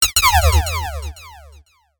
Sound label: science fiction laser gun or beam fire